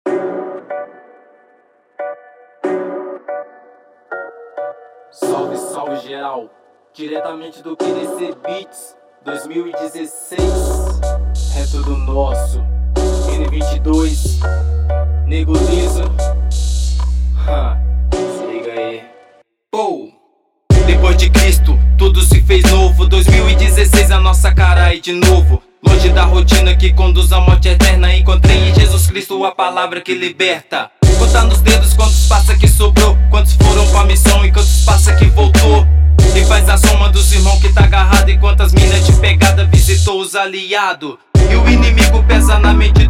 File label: beats